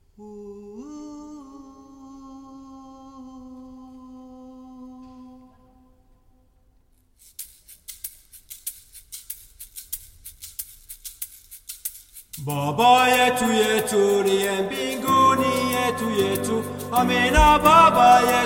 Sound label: Bass Piano